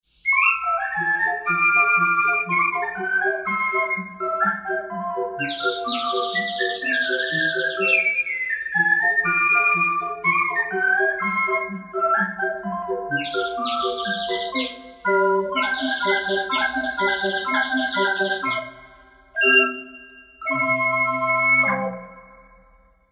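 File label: nightingale, ocarina; usignolo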